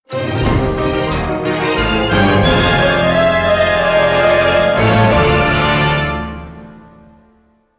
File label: Halloween Victory Music